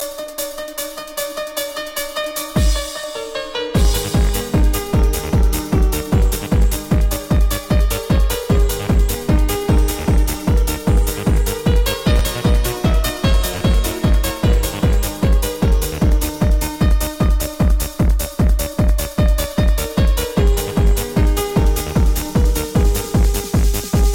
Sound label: fur elise techno